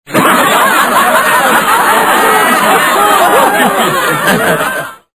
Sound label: laugh